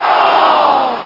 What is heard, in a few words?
miss